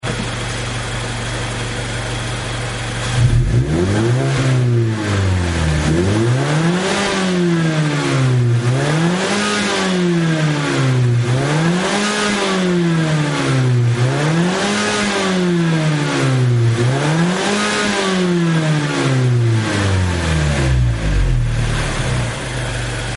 Mazda CX5 SkyActiv, Muffler; AutoExe

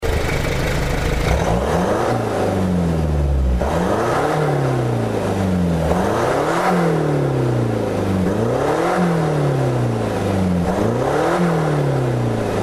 Mazda CX5 SkyActiv Muffler Audio; AutoExe

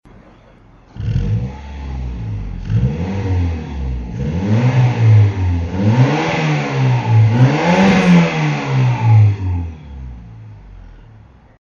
Mazda6 Atenza GH, Muffler; AutoExe

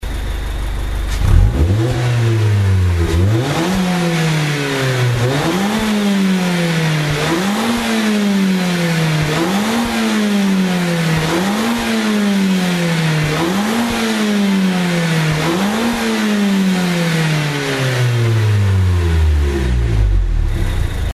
Mazda MX5, Muffler; AutoExe

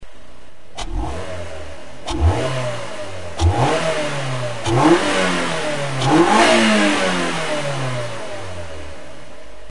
Miata MK3 sound; Intake; AutoExe

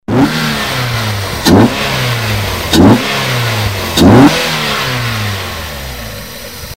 Miata MK2 sound; Intake; AutoExe

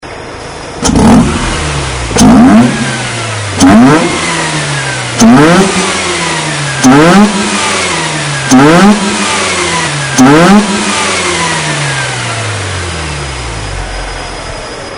Mazda RX8 Intake sound; AutoExe

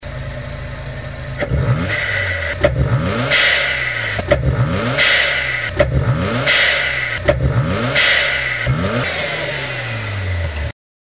Mazda RX7 Intake sound; AutoExe

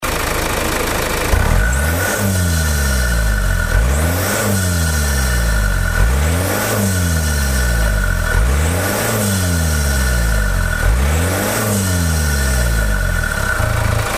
Mazda CX5 SkyActivD sound; Intake; AutoExe

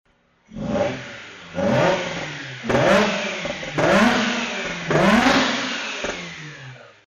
RX8 Muffler Audio; AutoExe

